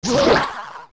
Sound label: Bubble Hit; zelda; OOT